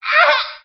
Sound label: "ah ha"; Voice; ooh ahh